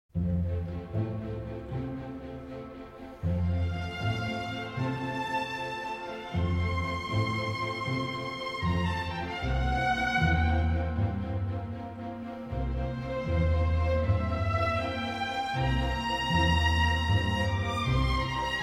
Piano Concerto No. 21 in C Major, Andante

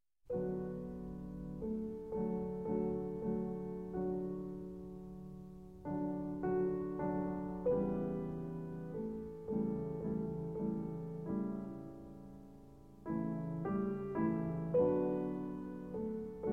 Piano Sonata in A Major; Piano Sonata No. 13; D. 664; Schubert

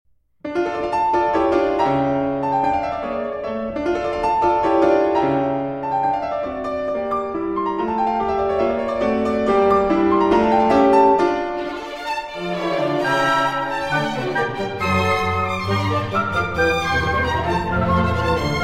Piano Concerto No. 20 in D Minor; Mozart

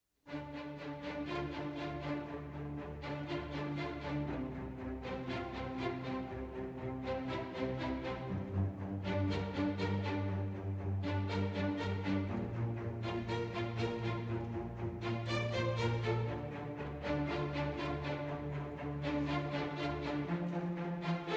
Orchestral

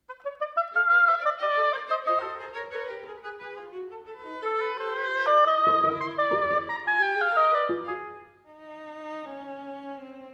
Oboe Quartet

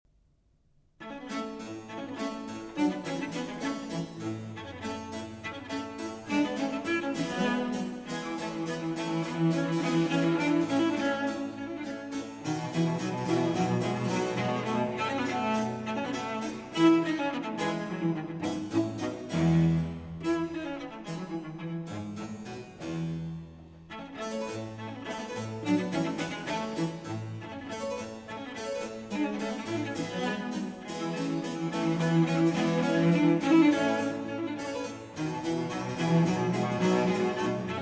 Sonata For Cello and Continuo in B Flat; Vivaldi; R.45

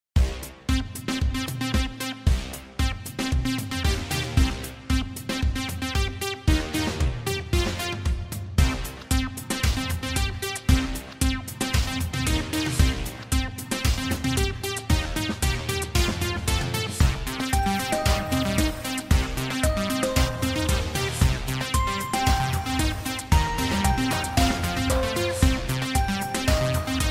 Futuristic Music

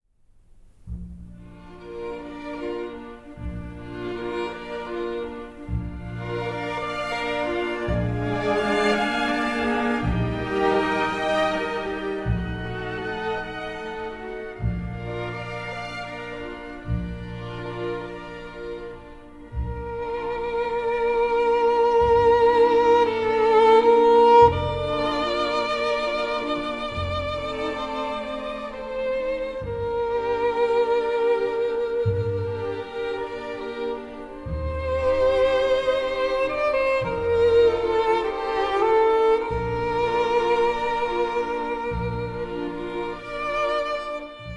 String Orchestra Ave Maria; D. 839

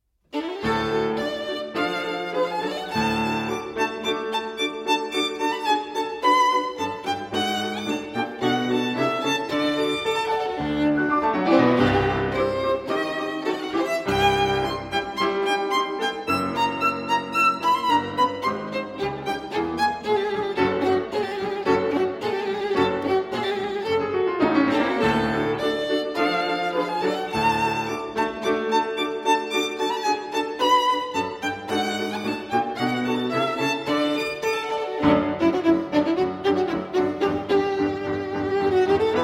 Violin Sonata in D Major, Allegro con brio

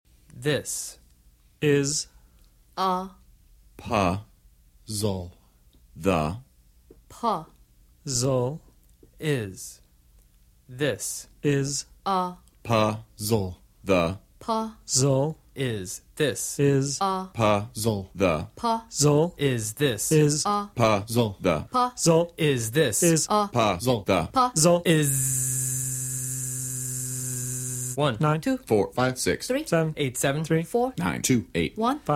Percussion

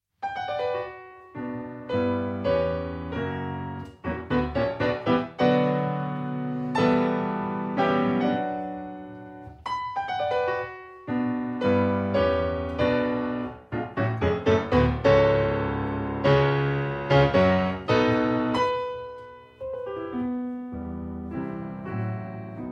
Piano Sonata No. 3 in B Minor Allegro maestoso

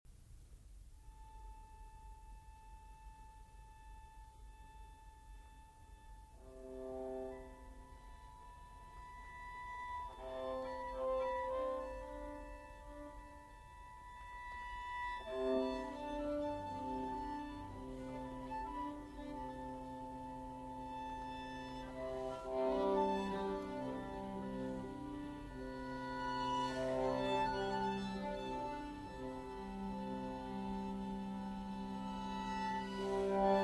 Quatuor Etudes Harmonics; Quatuor III; 9 Etudes

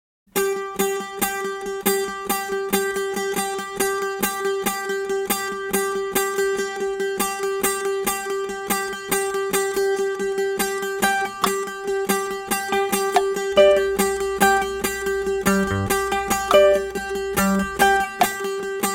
Toc Instrumental Version

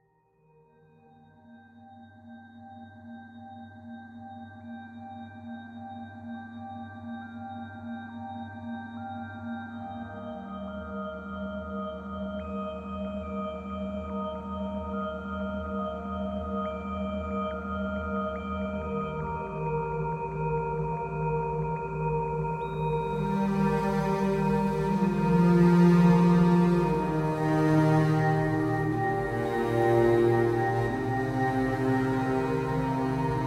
Spectral Multiband Resonator

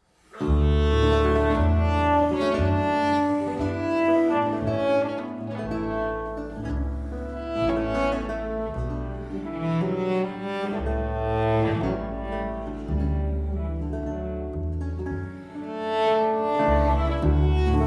Cello Sonata No. 7 in D Major, Adagio; Platti

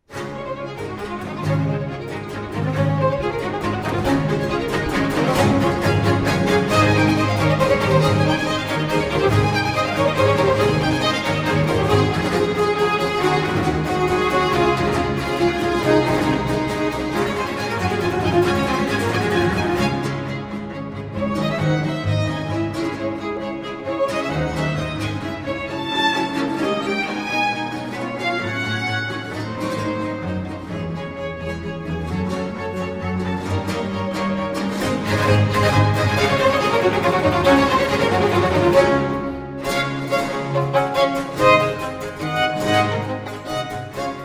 Violin Concerto in D Major, Allegro; III. Allegro

